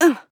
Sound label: Mage Grunt Slow; Technomancer; Realm Royale